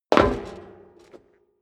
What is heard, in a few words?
step dress boots metal rattle jump; schritte; Footsteps; menschen